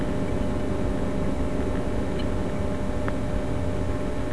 EL BULB; InventorLabs Technology; EDISON

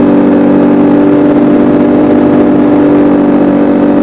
high offload; CRC2005; CRC 2005 Demo

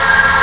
ahh; Epic Collection 3, The; Epic Marketing; muzak

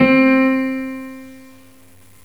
Santur; GMTones; Chromatic Percussion

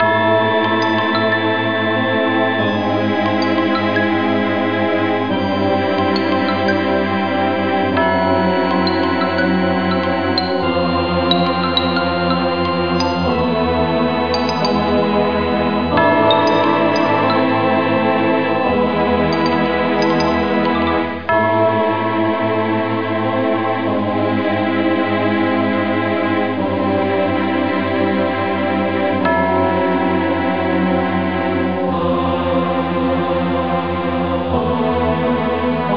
Pyre Pre Loop

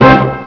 Orchestra; HyperComposer DEMO

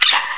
car; Connection BBS

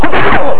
opschewo!

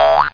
oooing; winmixer

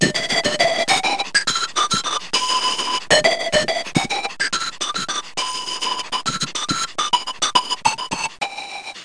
andean; ClickArt 300,000

